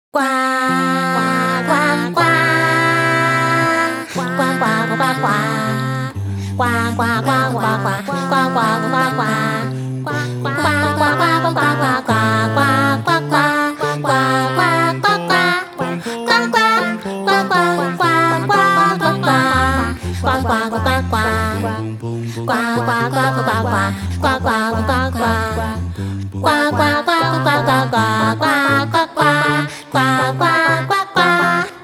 Lotus Leaf Vocal Ensemble (CN Ver.); Infinity Nikki; A Journey Born from the End

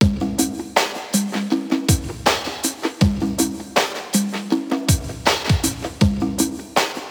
Result Boo Jingle

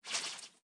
Rope Shot; PEAK (2025)

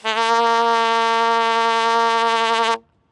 Bugle

